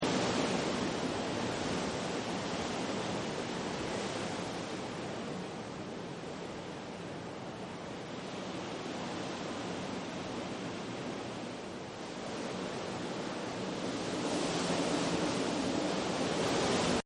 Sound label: Wind Loop; Weather; Partners In Rhyme